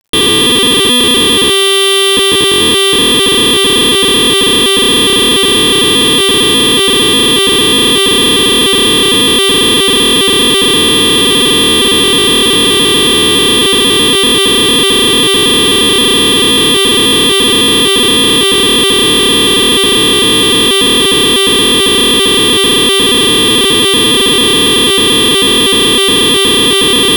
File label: Monster crash Plus